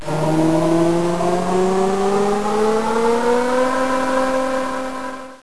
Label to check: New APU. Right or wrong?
Right